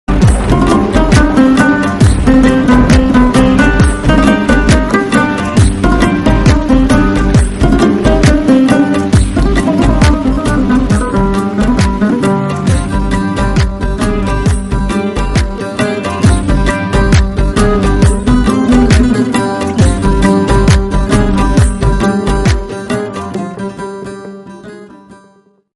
bgm; qalandar drama ost

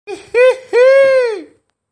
funny notification

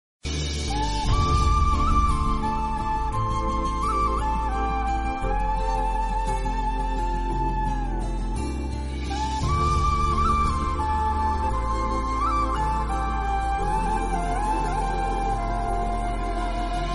background music; saaral

